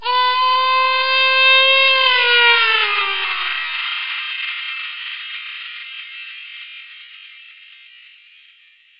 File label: baby cry